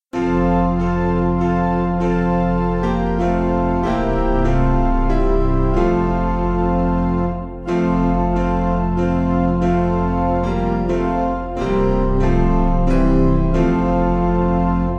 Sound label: Piano Round